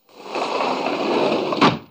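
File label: Slide Glass Door Close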